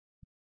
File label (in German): Tiefpass 200Hz